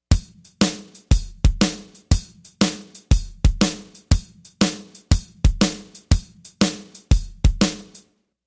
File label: Shuffle; Alfred Publishing